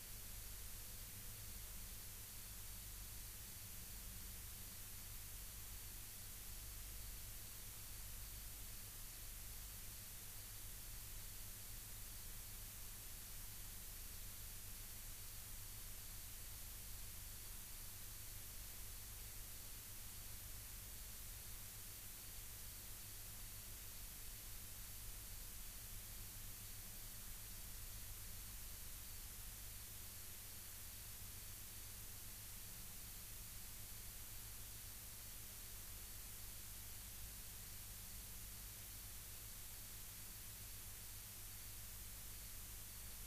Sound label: rayol farm; SEAMED